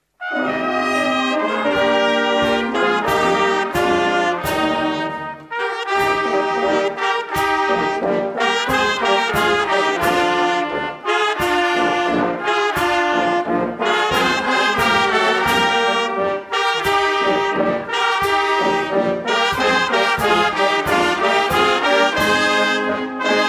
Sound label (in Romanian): Fanfara; Miercuri